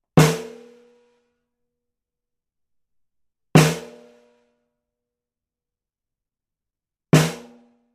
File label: Snare - TLM103 Card - Edge modelled; cardioid (kardioida); edge duo srovnavaci test